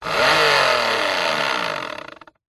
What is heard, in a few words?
industrial pump electric handheld on off short burst close to motor powerful rev